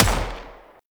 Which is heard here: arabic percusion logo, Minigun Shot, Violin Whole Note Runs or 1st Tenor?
Minigun Shot